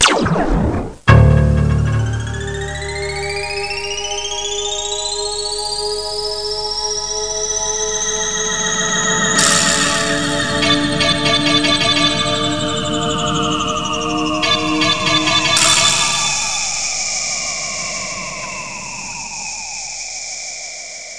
Time Opening Sequence; Let's Discover Michigan!

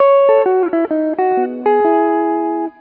jaz bit; Oasis demo

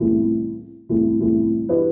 SYNTH HOUSE 125 A, Keys; eJay

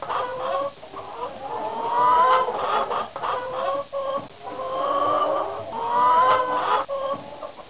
CHICK

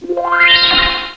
cosmic, cartoon sfx; Sound Effects for Multimedia - Volume 1

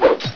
attack

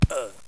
GRUNT; CLOSE COMBAT

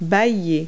yawn verb

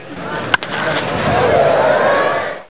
softball

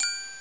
flash; McDonaldland - Silly Games; McDonald's; treasure

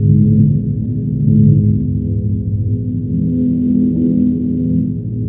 gate, sfx; Shadow Man

